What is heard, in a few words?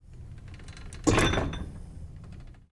weightlifting machine; prosoundfx